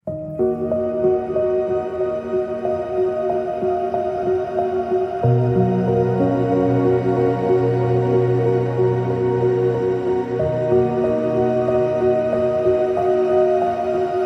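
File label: Her Voice Was Made of Water, ambient piano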